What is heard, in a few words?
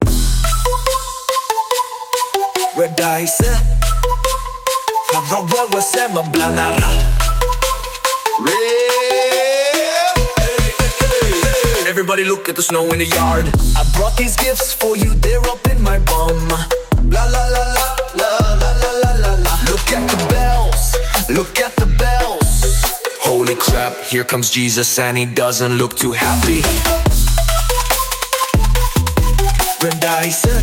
Christmas Dubstep; A Peter Griffin Christmas